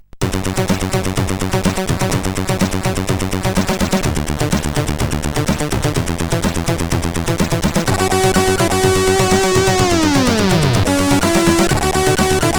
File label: Tune sid CSG8580R5